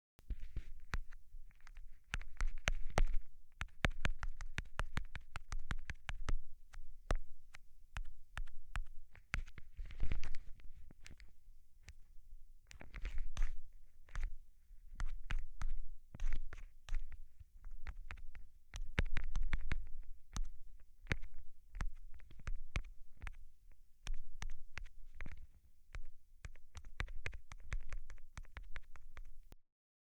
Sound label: Iphone tapping contact mic